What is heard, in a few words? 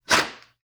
tape off; soundscrate